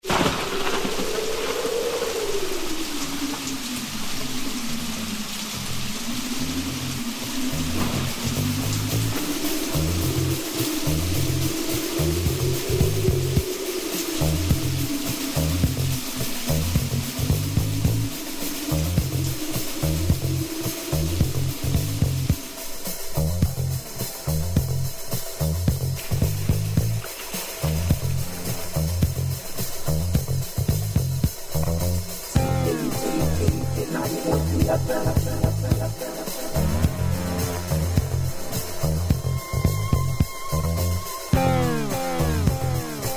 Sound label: Rain Fall Down